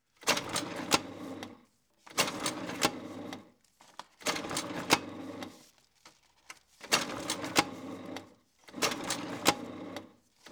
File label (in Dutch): postage machine